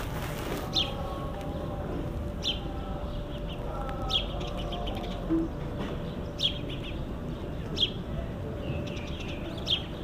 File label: Western Wall